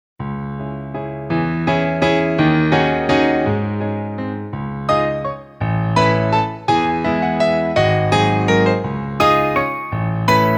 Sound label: Waltz, Ballet